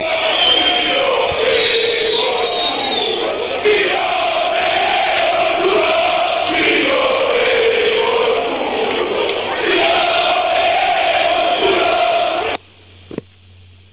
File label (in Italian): coro